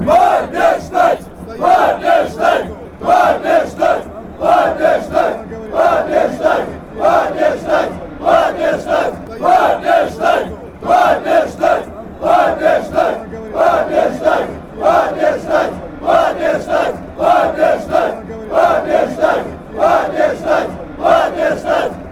cska chant

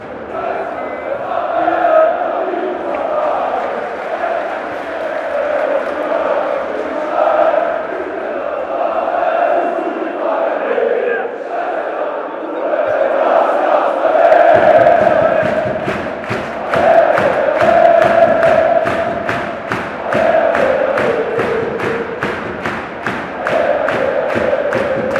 chant, fanchants; rapid wien

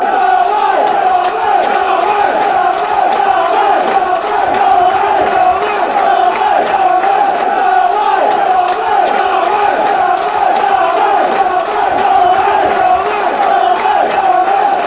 red army red army red army, chants; charlton

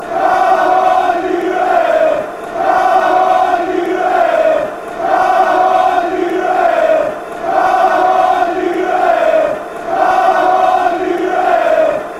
come on you reds slower, chants

